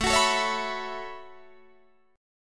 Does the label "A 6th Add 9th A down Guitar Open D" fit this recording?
Yes